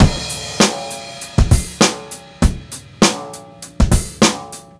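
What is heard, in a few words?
BEAT 2, 100 bpm, Funk; USB Soundscan vol.41; AKAI; Roots Funk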